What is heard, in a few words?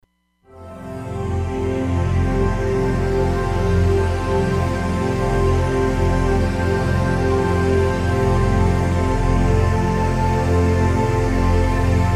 Motion Pad; Roland Fantom XR